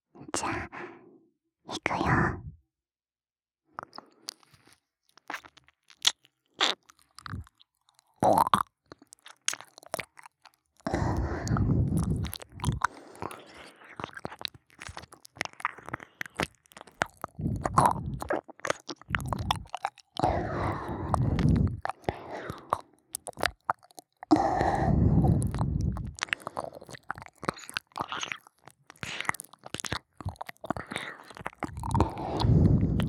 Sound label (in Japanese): 妹ちゃんの耳舐め, バイノーラル×ASMR; 妹ちゃんの耳責めテクニック 〜癒しの耳かきから耳舐め手コキまでお任せあれ♪〜; KU100